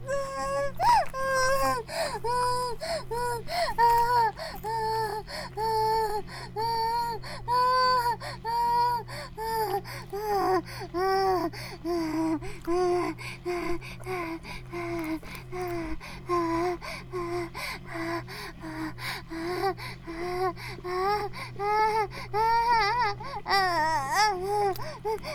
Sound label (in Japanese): バイブ放置; イクたびにわけて